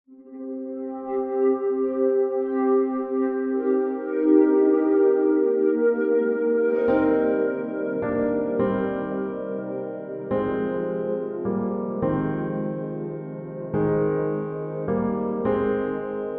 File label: PIANO